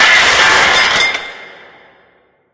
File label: grate fall, ambient